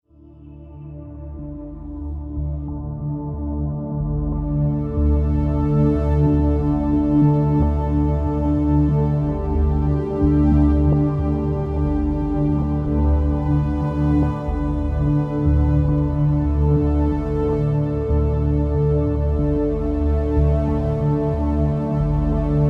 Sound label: Water ambient track; Heaven Forest VR MMO